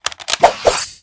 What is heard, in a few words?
Character Defence